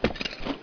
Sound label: movement